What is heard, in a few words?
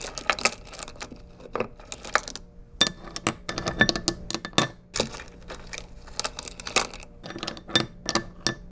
Candy Bowl, kitchen